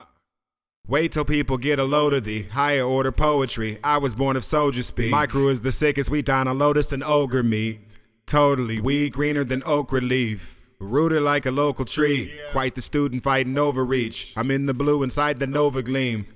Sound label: Acappella